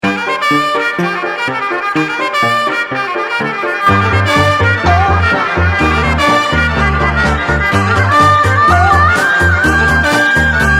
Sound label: DJ & Sax